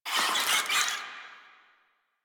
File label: Infested Ashen Vocal Attack; Warframe